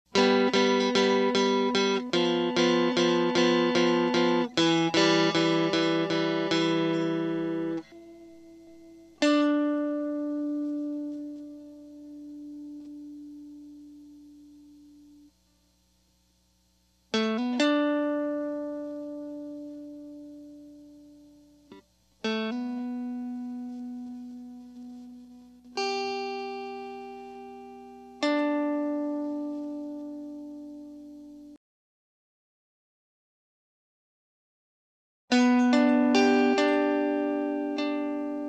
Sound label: Guitar